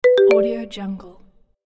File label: marimba alert notification